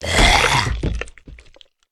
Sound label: zombie hit